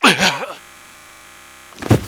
HalloweenPumpkin Die; Eft

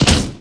gold beetle die

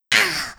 FAIRY MELEE Hit

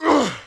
FIREMAN hit

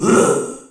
InstanceBoss Attack; Eft

